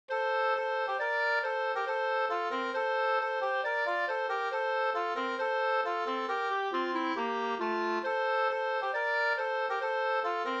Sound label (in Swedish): vismelodi Sanglek; Simon i Salle